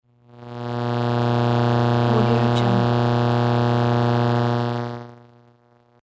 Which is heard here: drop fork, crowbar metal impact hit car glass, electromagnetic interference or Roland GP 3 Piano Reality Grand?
electromagnetic interference